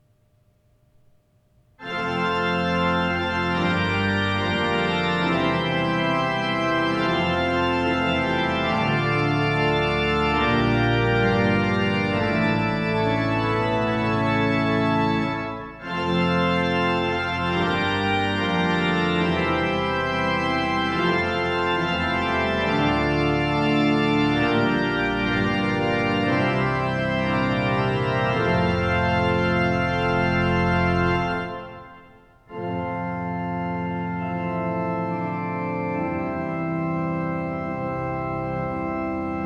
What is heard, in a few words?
Fanfare; Nordlandet